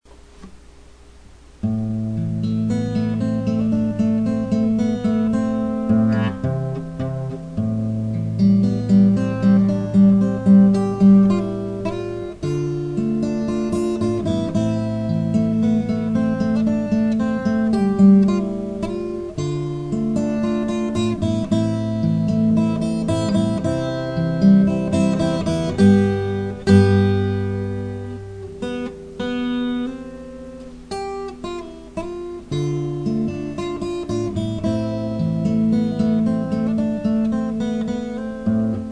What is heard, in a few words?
instrumental solo